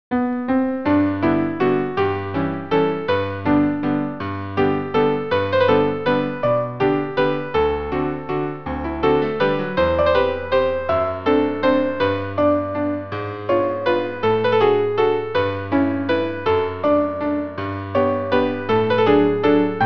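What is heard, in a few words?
serenade waltz; tcaik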